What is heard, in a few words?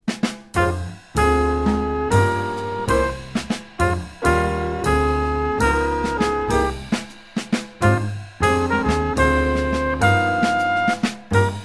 medium slow mix